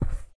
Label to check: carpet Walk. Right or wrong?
Right